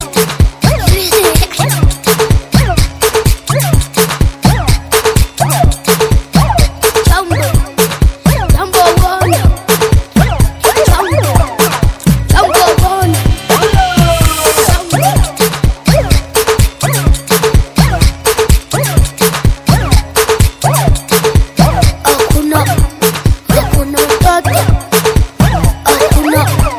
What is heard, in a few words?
african a dancing, ringtone